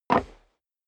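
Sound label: step boots wood creak jump, footsteps; schritte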